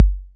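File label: 808 bd, bass drum, drum machines; 808 Extended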